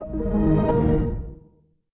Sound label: Startup; Windows XT; Windows Never Released Soundpack 1; deviantart